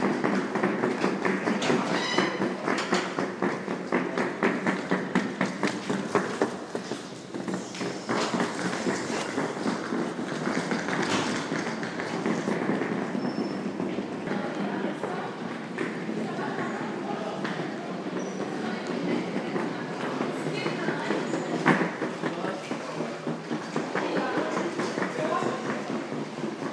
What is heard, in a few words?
gare centrale